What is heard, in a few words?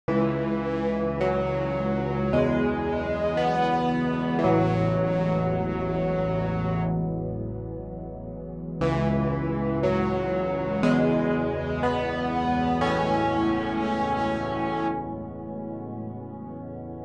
With Chorus